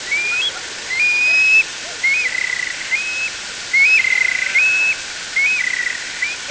call, Stone curlew, bitonal kurlee; Probably the bird is on the breeding ground: in the neighbouring hills there are many other Stone curlews calling; Burhinus oedicnemus

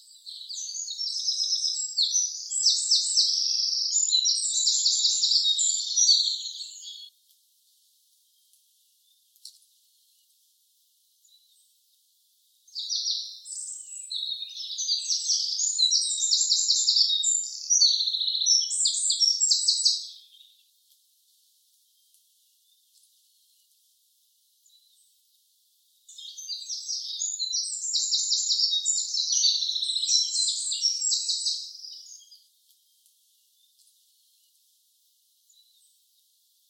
call, wren; built in directional microphone of Tascam DR100-MKIII; troglodytes troglodytes; The audio sample was stopped for one minute

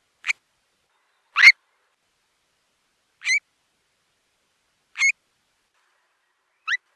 call, Stone curlew; Time interval between calls has been reduced; Burhinus oedicnemus; true average interval: 9 s

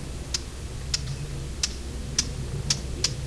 call, Blackcap, alarm call; this call is easily heard when approaching the bird; sylvia atricapilla; ALTITUDE: +130 m.

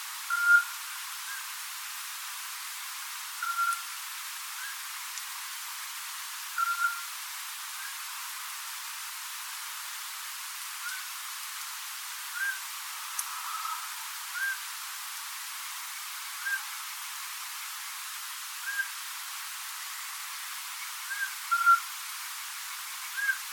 call, scops owl; Sony ICD-PX470 with external microphone; otus scops

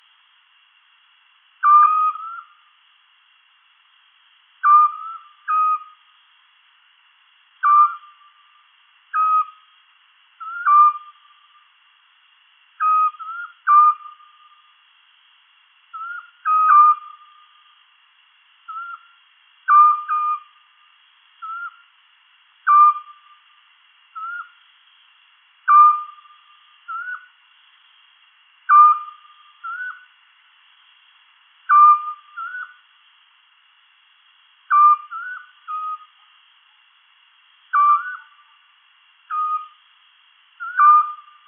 call, scops owl; otus scops; attribution of this call to a female is pretty uncertain

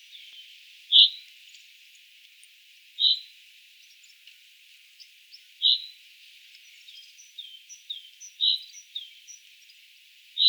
call, chaffinch; Southern Tuscany; Background: Great tit; Fringilla coelebs; Till now in Southern Tuscany I have found only one dialectal type

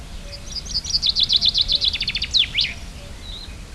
call, chaffinch, full song. - SEX/AGE: adult male; Poderone near Magliano in Toscana; ALTITUDE: +130 m.; fringilla coelebs

